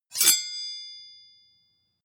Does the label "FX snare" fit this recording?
No